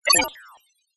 science fiction robotic cyberpunk electronic equipment beep glitch; zapsplat